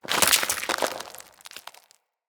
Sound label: Root Spike Impale End; Powers; Bromius